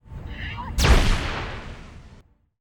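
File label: beholder attack, monster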